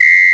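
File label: Whistle, Roland S50, Percussion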